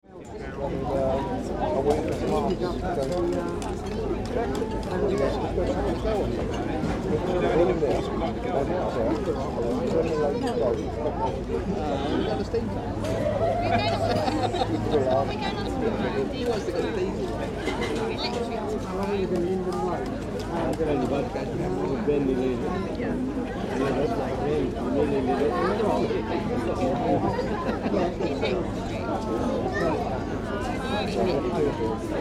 Cafe Chatter Exterior, Mixed chatter and activity atmosphere; pub garden; Cafeteria; Tom Hutchings